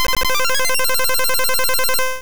Aurora Beam

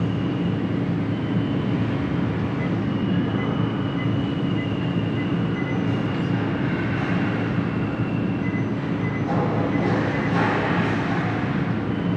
Mars Ambient; Mass Effect Infiltrator

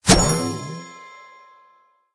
Big Bird; angry birds

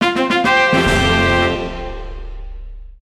Notification Fanfare; Kirby's Return to Dreamland Deluxe

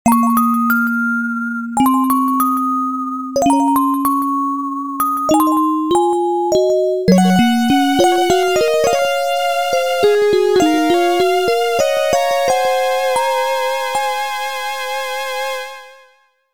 Music box of moonlight; Fantasm Soldier Valis II; PC-98

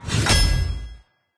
attack

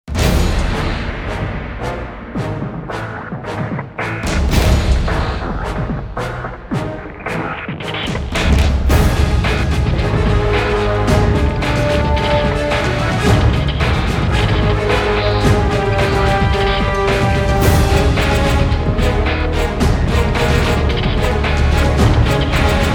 March; World of Tanks

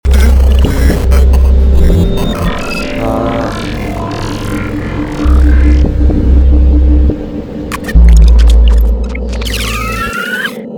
Glitch This Is A Police Car; puss soundtrack

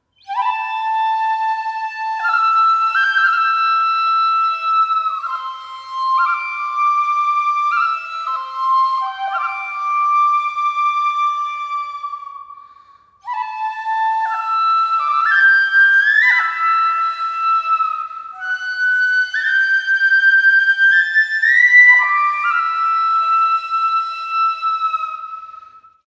Field BGM; Xenoblade Chronicles 3

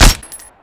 BERETTA MX4

